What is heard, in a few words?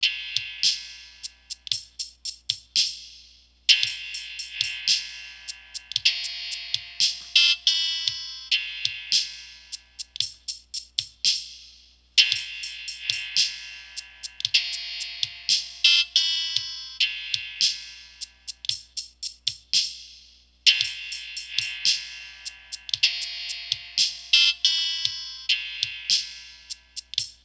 synthwave start; beats